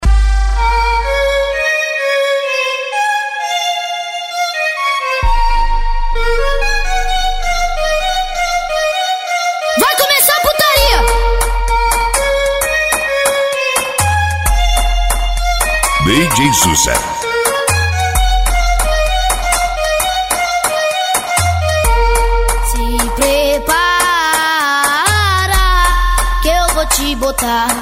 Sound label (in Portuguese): pagodao funk; total som; carnaval 2015